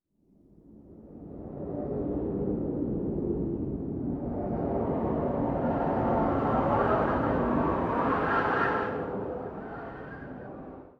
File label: wind dark; anemoi